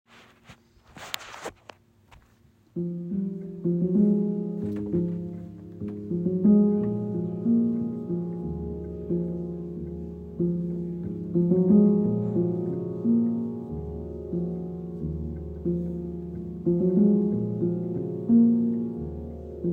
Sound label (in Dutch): Pianoidee bij raven in ochtend